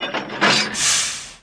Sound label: truck connect; vcmod